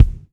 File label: BD, Bassdrums, Roland TD-20